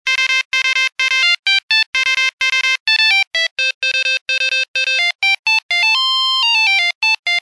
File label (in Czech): Melodie Nokia 5110